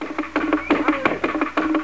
tribal d

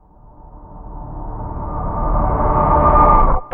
REVRS CHR; BEAT2000

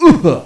pain; JOSEPHIN

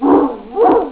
DOG, ANIMALS; EFFECTS

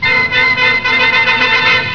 SUSPENS, MUSICAS

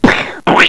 SPLAT; FSTMOUSE

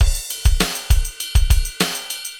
ROCKS, ROCK POP DRUM; MAGIX

